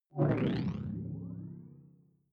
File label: sci-fi alien big bionics down; swooshes-swishes